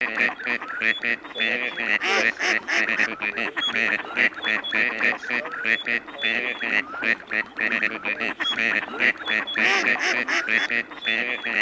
Серая утка Canapiglia; plurifon

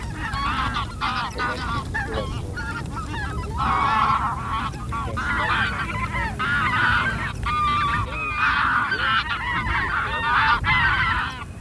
Гусь базар Oche Mix; chip mini gusiniy 5B plurifon

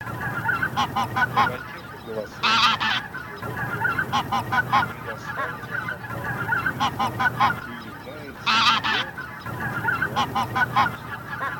Гусь серый Oca Selvatica; chip mini 234 plurifon

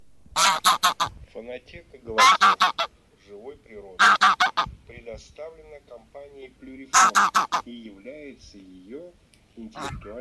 Гусь серый Oca Selvatica; plurifon

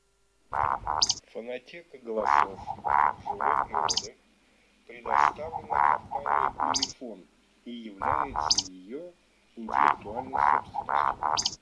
Вальдшнеп Beccaccia

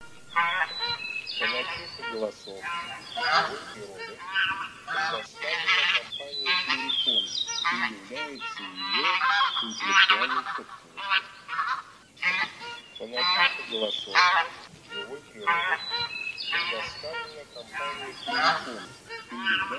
Гуменник Oca Granaiola; plurifon

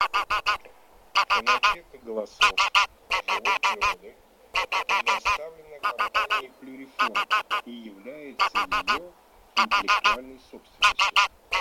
Гусь серый базар Oche Selvatiche; plurifon

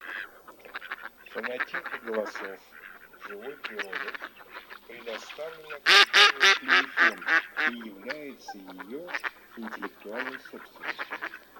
Кряква Germano

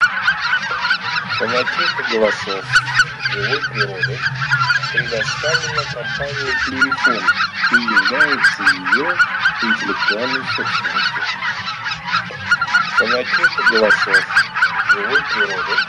Белолобый базар Oche Lombardelle; plurifon

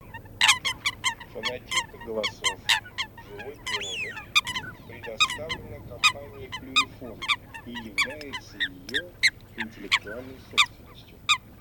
Лысуха Folaga; plurifon

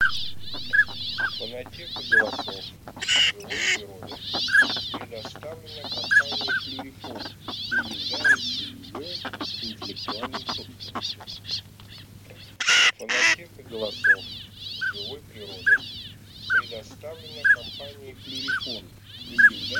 Шилохвость Codone

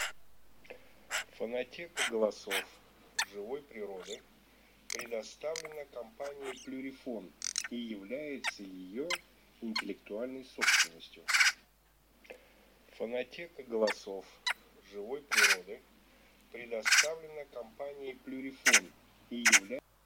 Сорока Gazza pica pica

